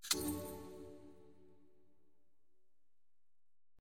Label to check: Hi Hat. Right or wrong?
Wrong